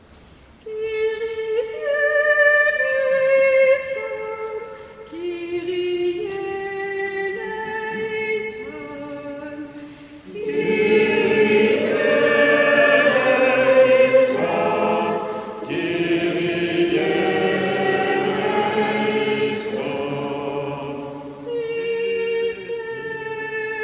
chant kyrie

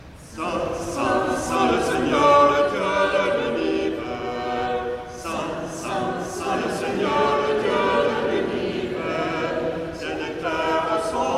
chant sanctus

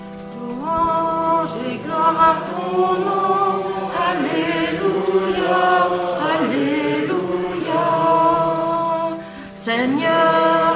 chant entree

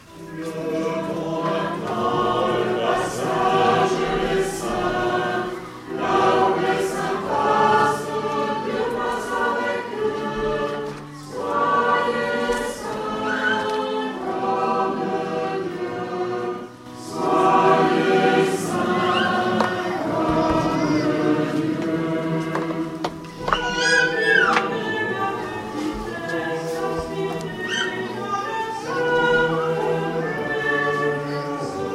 chant envoi

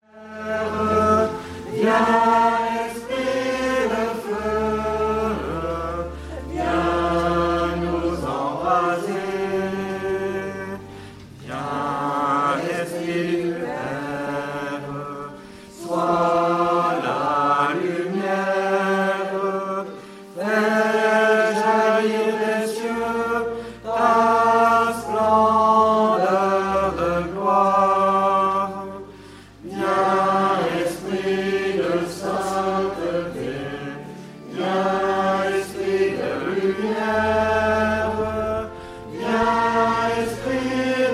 chant entree

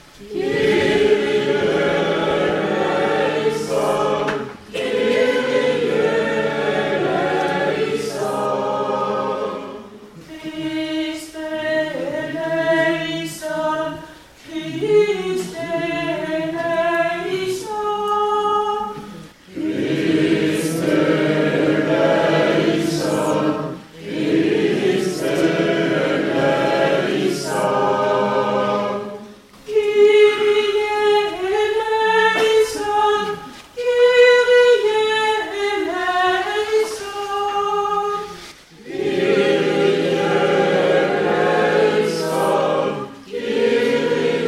chant kyrie

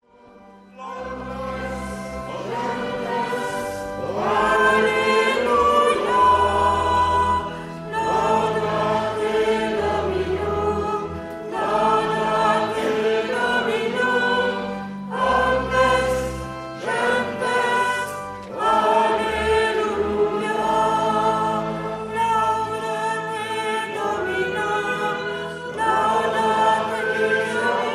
chant entree

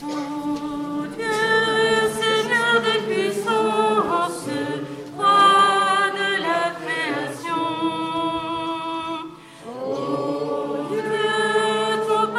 chant entree

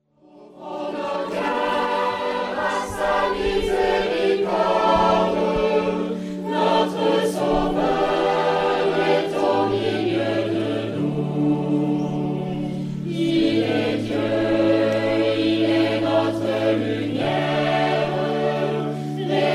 chant entree

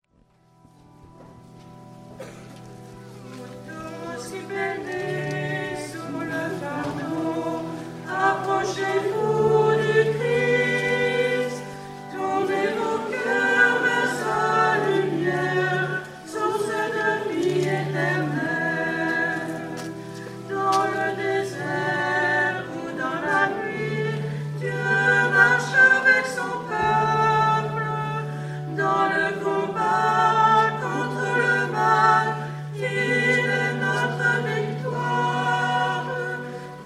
chant entree

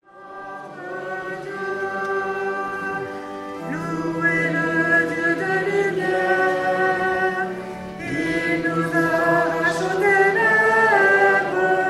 chant entree

